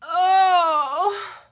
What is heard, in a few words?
ohh; cstrike